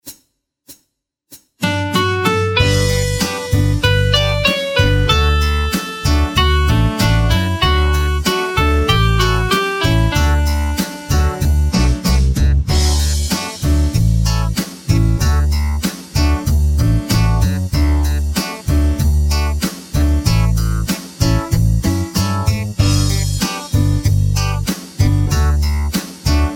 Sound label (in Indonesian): Instrumen Minus One Nada Tinggi